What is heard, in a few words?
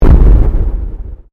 Convolution Pulse